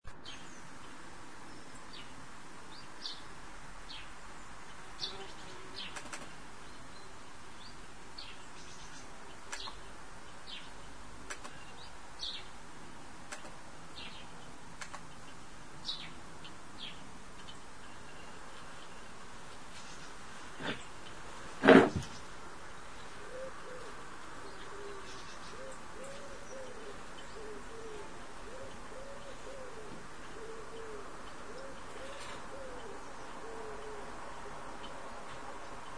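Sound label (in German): vogelgebrüll; soundman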